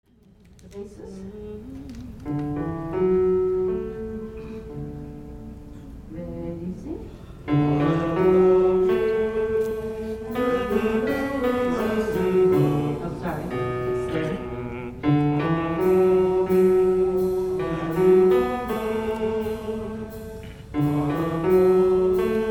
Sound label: bass; Gather Hymnal